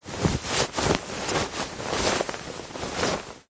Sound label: clothing shirt movement rustle; zapsplat